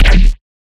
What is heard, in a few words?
Bass Hit; neuro; BIG5AUDIO